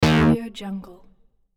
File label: game show wrong answer